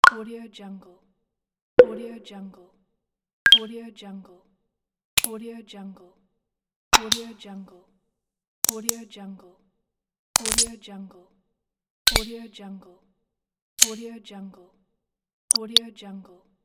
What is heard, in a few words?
click